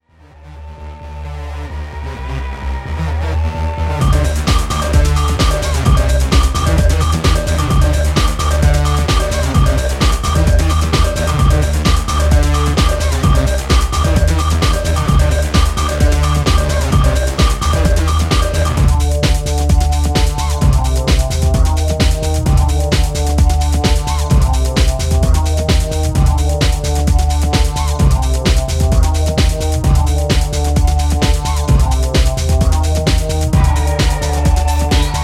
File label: industrial metal